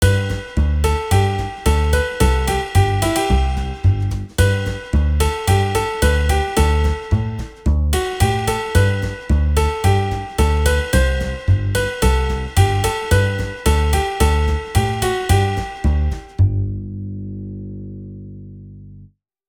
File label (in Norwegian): puls og rytme RYTME